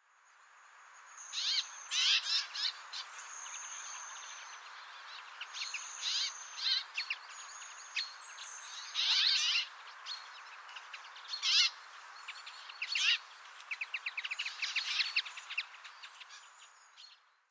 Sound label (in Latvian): bars, balsis; a.celmins